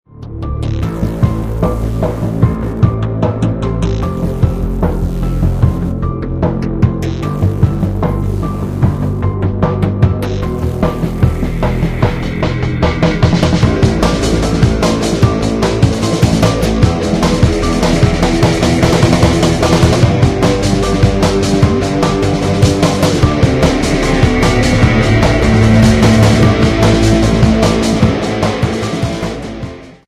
ending triumph; Source engine

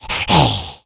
become alert, infected; Left 4 Dead 2; Source Engine

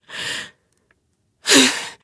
breath; Portal 2; Source Engine; video games

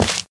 white tile, footsteps

